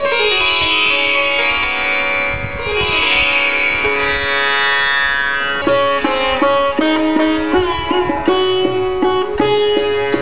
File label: Sitar, Kafi